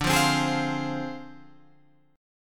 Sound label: D Minor 7th Flat 5th Guitar Open F; Dm7b5; strummed